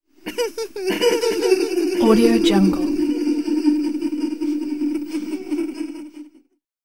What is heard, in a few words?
sinister laugh; chicasound